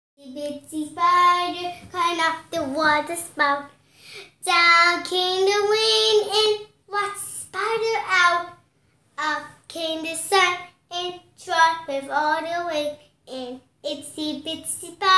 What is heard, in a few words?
Itsy Bitsy Spider Sung